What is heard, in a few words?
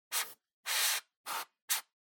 Air Duster